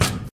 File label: bomb ball